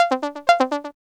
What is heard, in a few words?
BLPSEQ